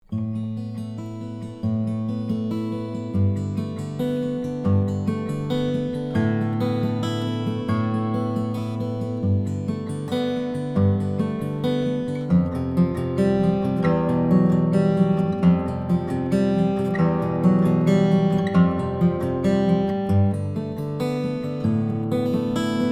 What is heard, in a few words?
AKG C414, Darkness; SANTA CRUZ OMPW; CARDIOID; straight signal with no additional EQ or effects